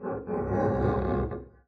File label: arco noise creak, double bass; dsmolken; noises